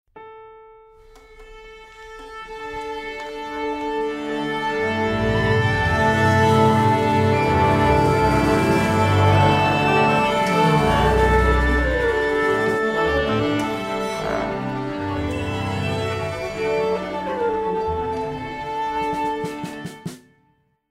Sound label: tune up